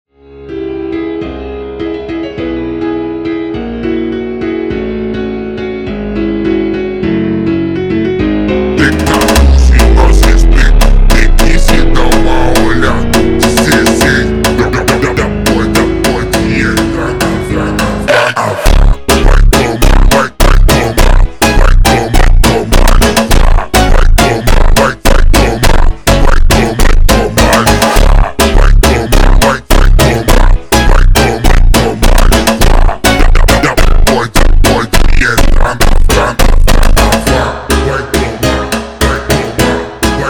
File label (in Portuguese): super Slowed